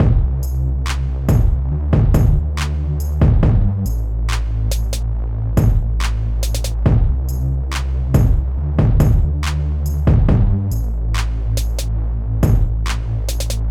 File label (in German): Beat Beispiel